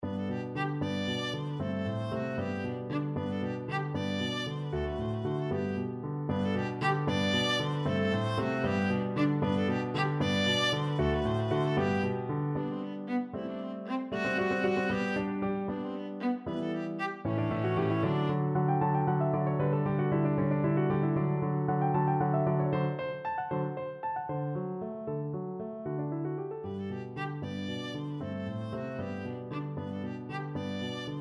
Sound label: clementi sonatina op 36, viola